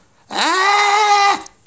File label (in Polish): krzyk; autysty